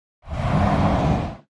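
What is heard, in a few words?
Space Door Open Slide, doors high tech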